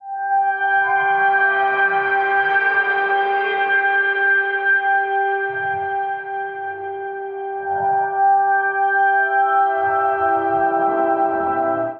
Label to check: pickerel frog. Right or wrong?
Wrong